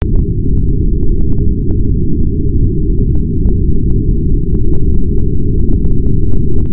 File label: brownian noise